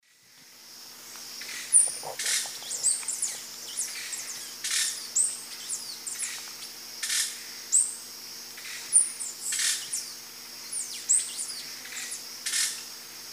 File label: Dacnis cayana, calls and song group; S. America; Eastern Lowlands